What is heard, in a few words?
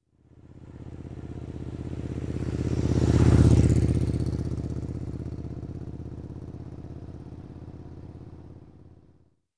Motorcycle passing by